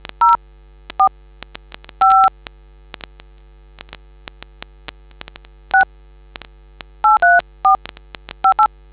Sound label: dtmf